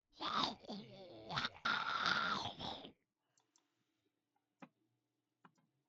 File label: snarl; ghoul